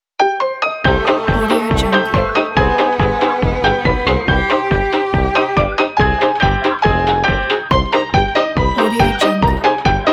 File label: retro old cinema room electro swing